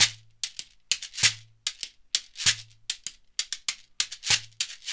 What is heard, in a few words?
SHEKERE, Synclavier Percussion; ILIO